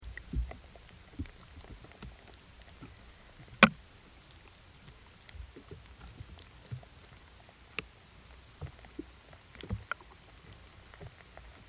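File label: acoustic emissions pinus sylvestris; marcusmaeder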